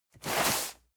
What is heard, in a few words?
cardboard box medium slide hard; objekte